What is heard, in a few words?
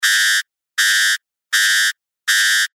warning signal